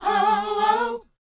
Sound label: SHOO BE, Vocal R&B Dance